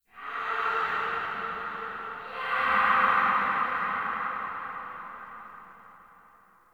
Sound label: Interior Texture Aggression Calls, Infested, Ambience; Misc Indoor